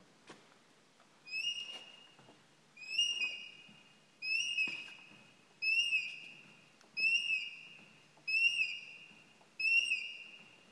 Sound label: squeaky altar rail in church; thompson church norfolk